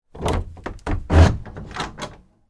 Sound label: Door Open Cellar, Foley, Door, Open, Cellar, Door, Wood, Planks; Miscellaneous Door; Blast Wave